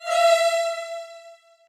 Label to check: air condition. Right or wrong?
Wrong